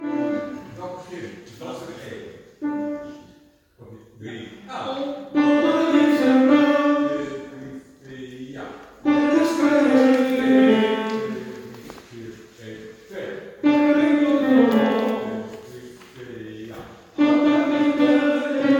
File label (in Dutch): Bas